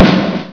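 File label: alt snare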